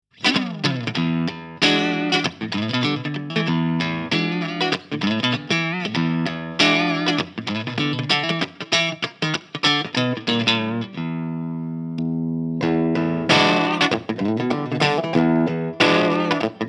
Schecter Almost Clean 3 Way Switch

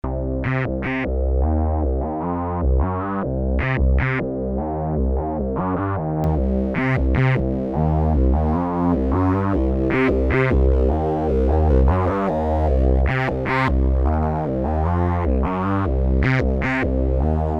dreadbox Kinematic Moog Seq.

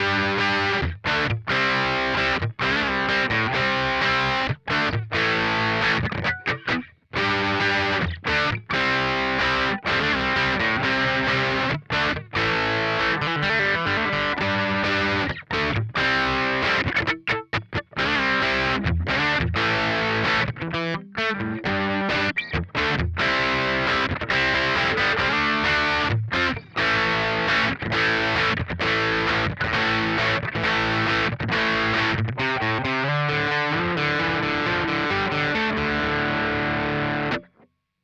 Vox Valvenergy Mystic Edge Cab

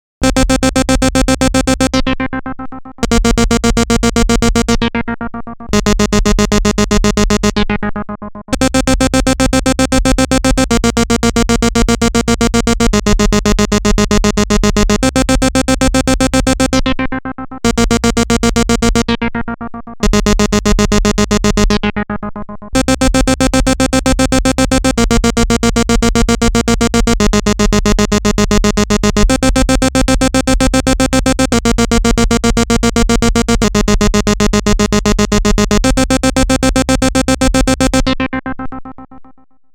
Roland SH-101

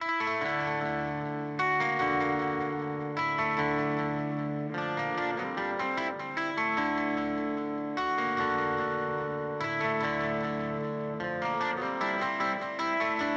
Boss GT-1000 Core Delay; Vergleichstest

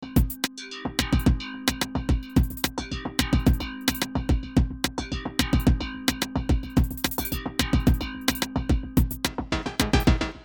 No Shuffle

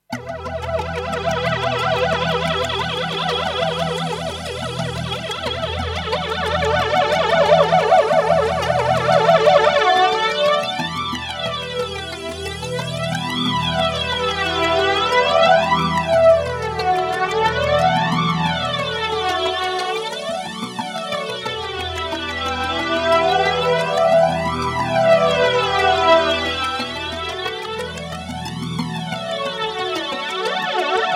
Flanger Pad Loop

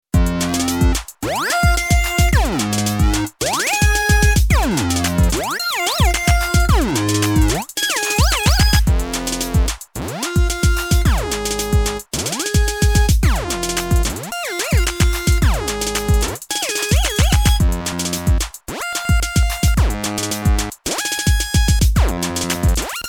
Casio XW PD1 Solo Synth Presets

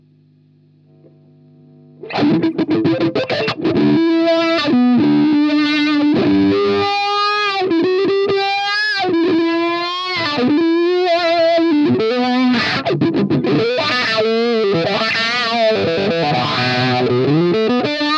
Cry Baby Solo

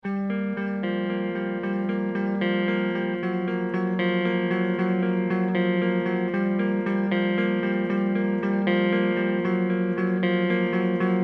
Ibanez RGD71AL Clean Neck

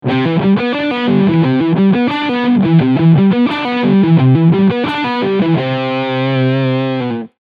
ARPEGGIOLINES

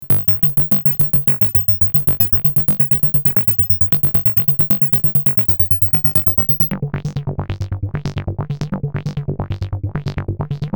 Dreadbox NYX V2

